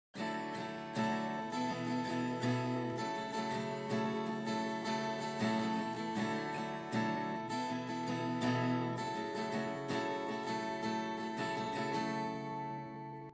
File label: pre chorus